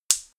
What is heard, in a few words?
gas stove hob ignite single; gastronomie; berufe